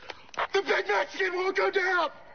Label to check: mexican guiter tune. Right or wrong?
Right